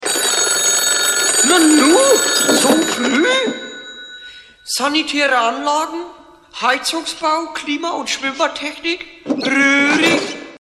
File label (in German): telefon klingelt roehrig